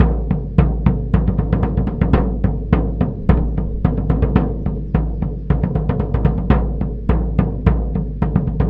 drums simple loop; Ultimate Admiral Age of Sail

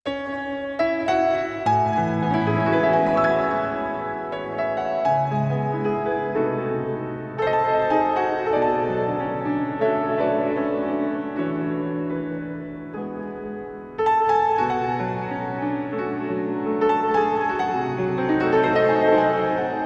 Jazz Latino

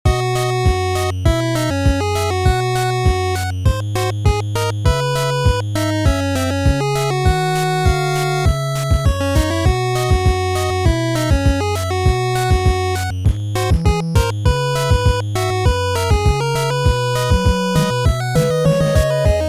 Main Theme 8 Bit; Touhou Ibunseki Ayaria Dawn

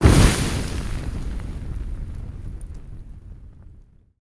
Arrow Launch Fire; Timelock VR

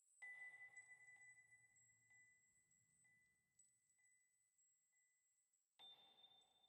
85bpm; Legends of Pegasus; windows gamerip 2012

